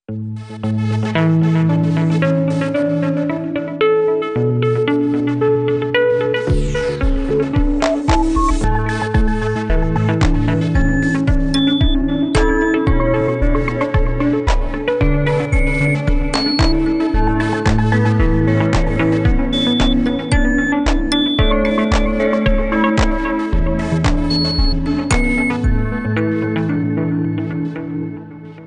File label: Vivo Neo9 Pro Ringtone